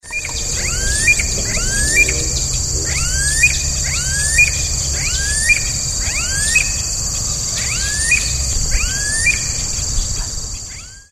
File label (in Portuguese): anu preto, aves